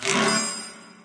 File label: 3 Star; candy crush saga gamerip